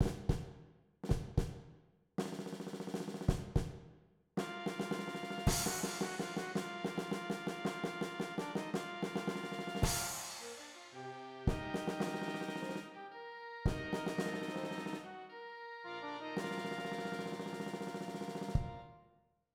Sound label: Fox perc; 20thCenturyFox